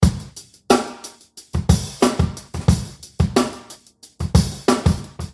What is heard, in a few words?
Hip Hop Drummer